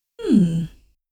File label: hmm; dialogs